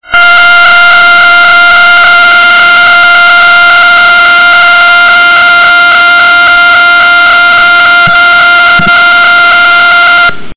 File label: Door bell; Pulsar Sound